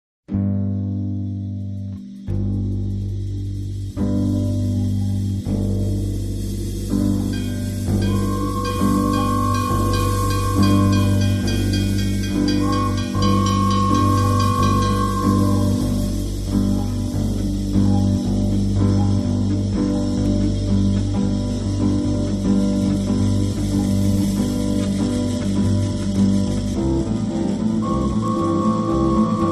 harpa bossa

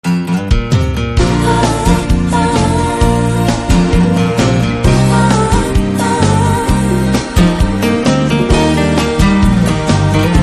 acustico

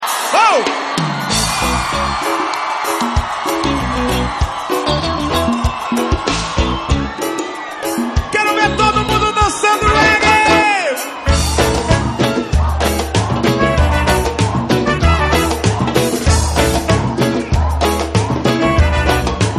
samba ao vivo

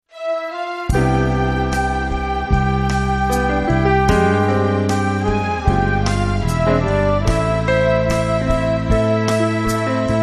orquestra bossa jazz

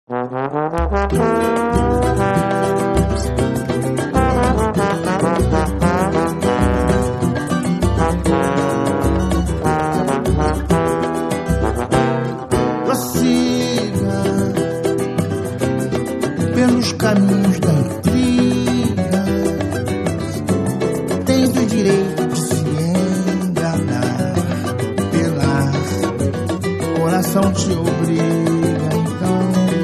samba

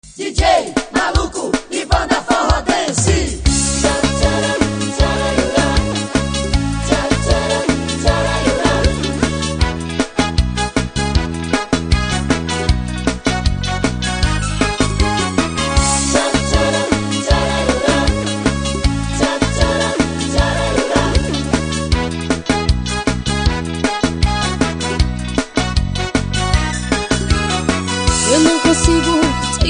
forro dance

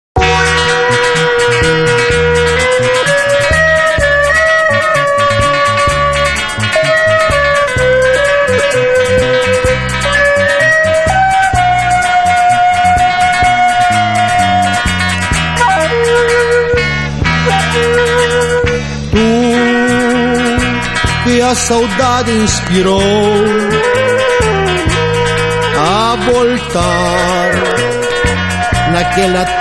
guitarra

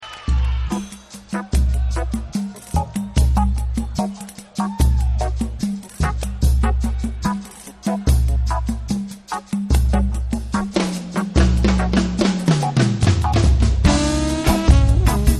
samba chic

